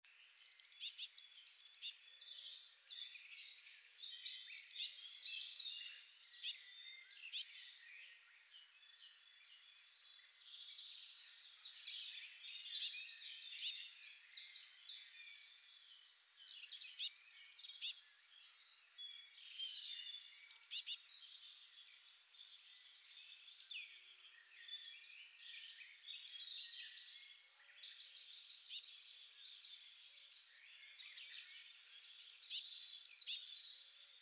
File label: Cyanistes caeruleus; SETE